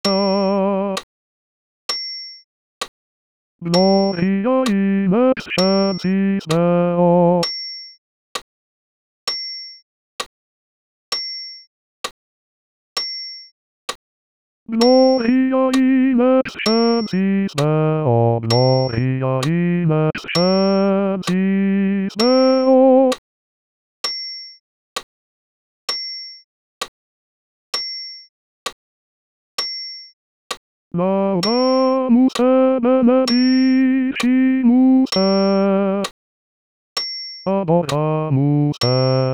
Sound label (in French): Gloria bas voc seul